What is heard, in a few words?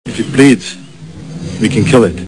bleeds